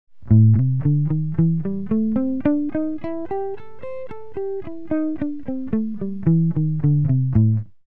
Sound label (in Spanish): blues menor